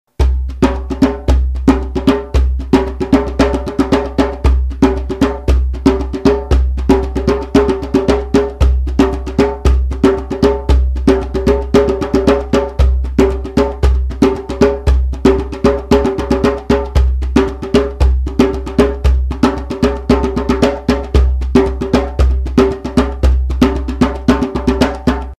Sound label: Djembe